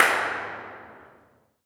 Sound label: Impacts, Effects; Vengeance; Essential Clubsounds Vol.4